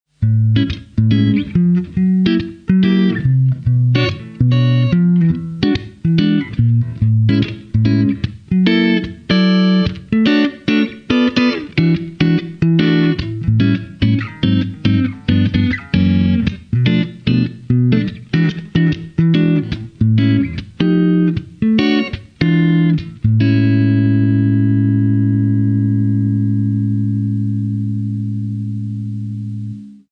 blues engine neck clean

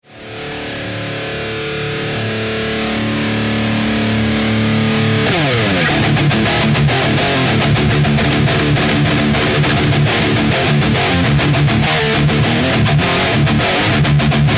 steam hammer metal

